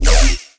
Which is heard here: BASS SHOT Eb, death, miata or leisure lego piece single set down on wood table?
BASS SHOT Eb